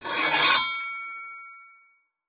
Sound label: Execut Threat Sword